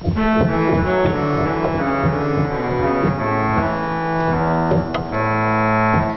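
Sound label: Fagott, organ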